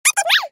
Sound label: clock